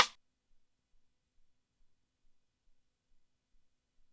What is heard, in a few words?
Rimshot, Drum Machines, ELKA X1000